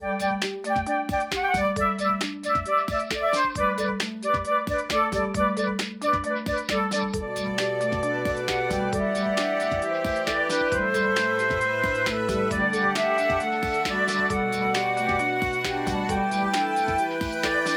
bgm; dinosaur run

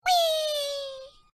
enemy dead; gingerman rescue

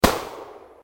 shoot; target hunt

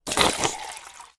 sauce; burger time

